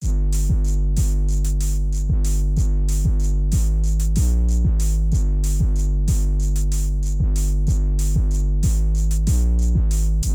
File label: speed phonk